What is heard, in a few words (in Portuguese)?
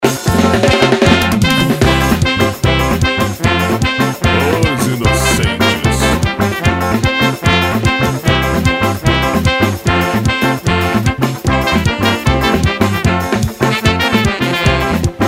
solo metais